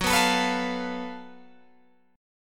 F sharp Minor Major 7th Double Flat 5th, Guitar, Open G